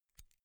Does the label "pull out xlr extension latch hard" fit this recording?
Yes